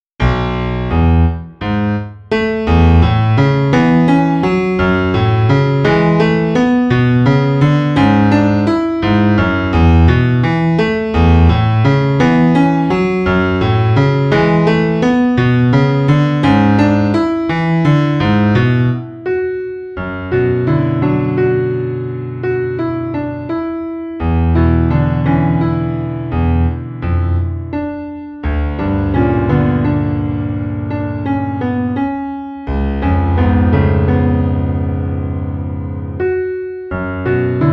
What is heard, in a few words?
waltz piano